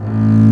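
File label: double BASS A1; AKAI S-Series CD-ROM Sound Library VOL-8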